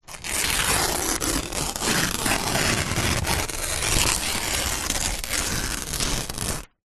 Paper Rip Slow

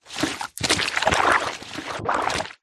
cartoon slime wriggle squirm squelch; zapsplat

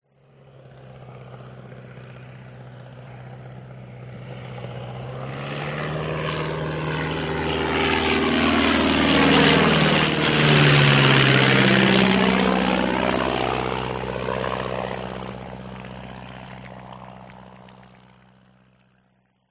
Airplane Prop; audio hero

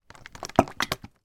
household food olive oil bottle shake

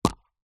household aerosol deodorant can plastic lid off

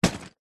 office pencil case full drop

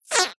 cartoon squeeze

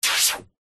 magic wand zap spell; zapsplat

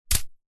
hacky sack footbag catch hand

